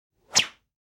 shrapnel whiz

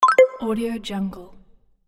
message

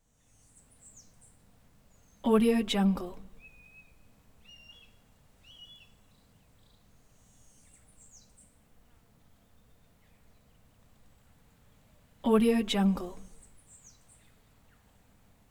jungle dawn; prosoundfx

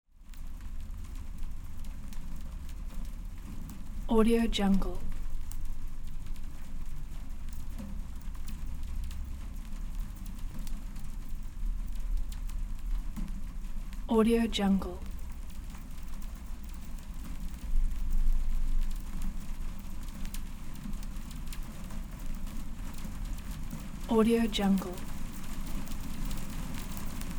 vibrations of rain falling on metal sheet

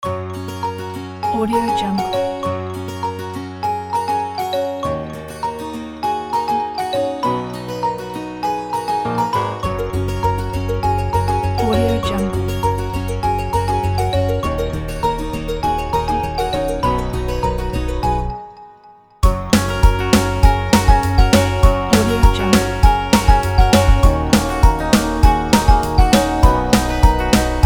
happy upbeat ukulele

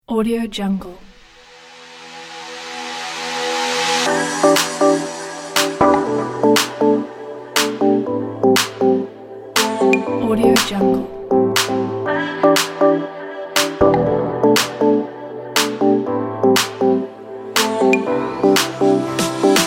deep house fashion pack

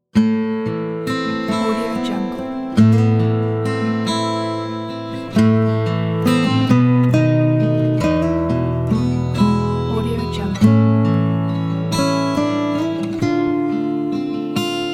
russian romance

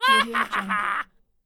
goblin laughter

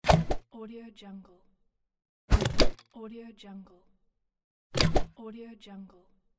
pneumatic tube mail vacuum system

